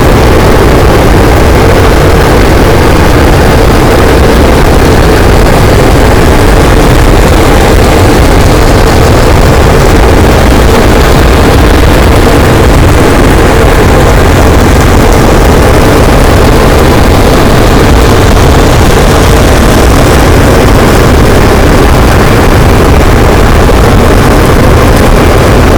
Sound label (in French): cachalot, boat; Physeter Macrocephalus